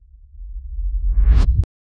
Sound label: a dark cinematic sound, reverse swell